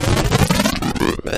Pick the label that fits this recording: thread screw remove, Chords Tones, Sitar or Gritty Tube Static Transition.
Gritty Tube Static Transition